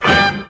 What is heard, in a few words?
wiped